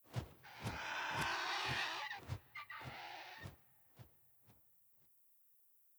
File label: Vulture Overhead Fly By; Devour; The Town; 3D Environment; devour 2021 gamerip pc macos